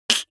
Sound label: human mouth beatbox snare clap; smartsoundfx